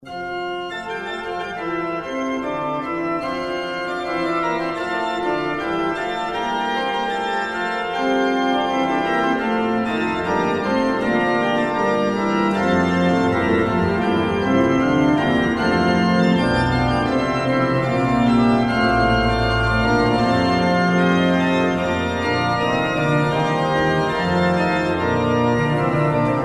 Praeludium in E Major; Dietrerich Buxtehude

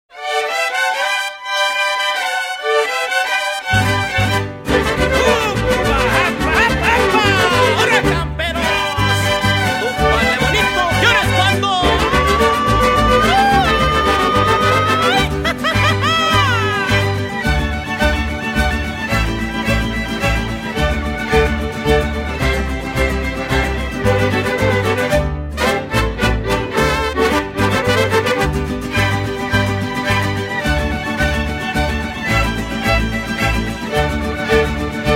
Mariachi

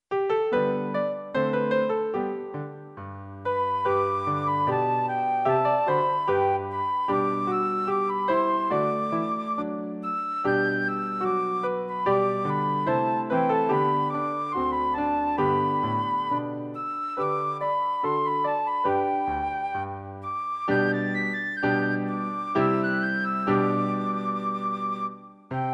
G, high, piano acc.

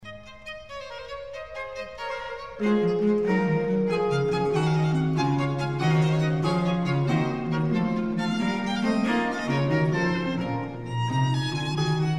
Trio Sonata Allegro; Arcangelo Corelli; Op 3 No. 2

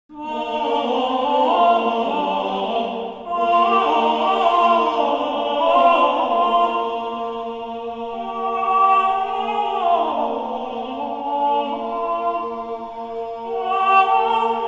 organum duplum; Leoninus and colleagues; Viderunt omnes

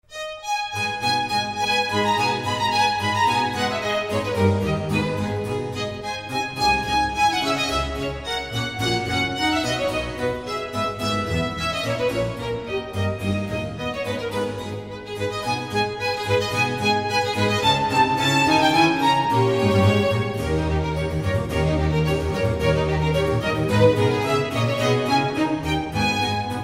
Violin Concerto in A minor Allegro; Antonio Vivaldi; Op 3 No 6 from L'estro armonico

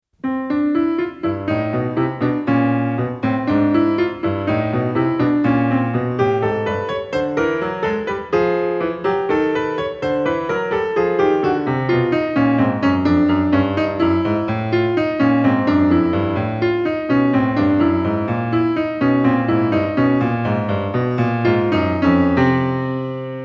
Staccato and Legato; Béla Bartók; Mikrokosmos

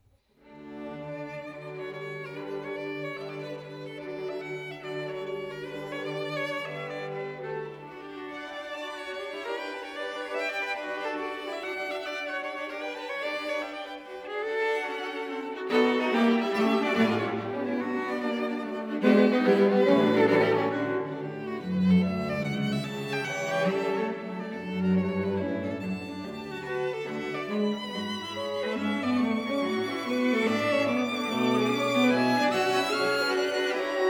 String Quartet in C-sharp Minor 2nd Movement; Ludwig van Beethoven; Op131

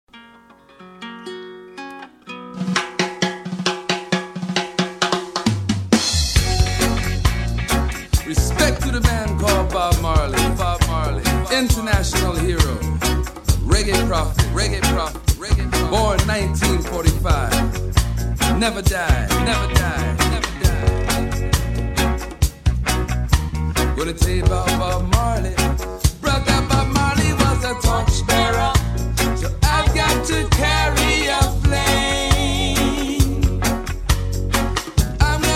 Jamaican Reggae

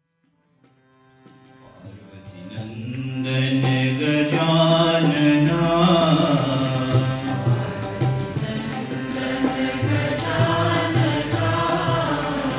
Bhajan Devotional Song

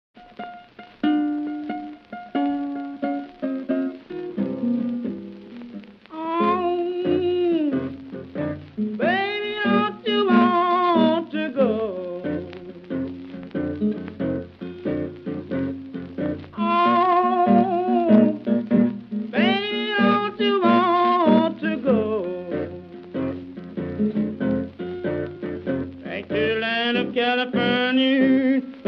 Country Blues

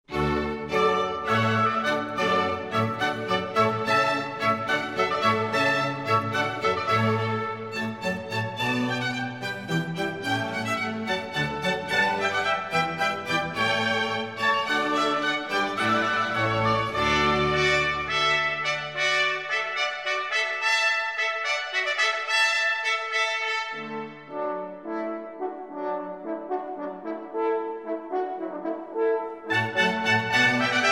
hornpipe; handel

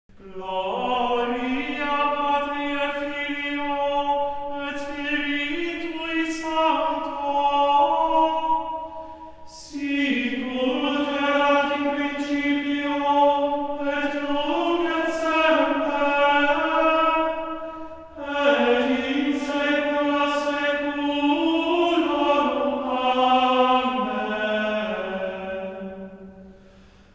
Gregorian chant Mass, Introit; Mass for Christmas Day

